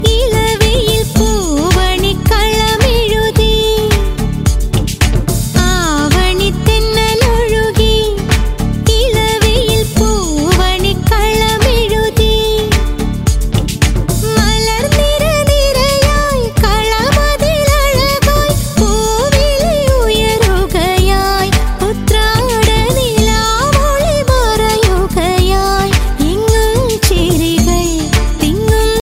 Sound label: onam song malayalam ringtone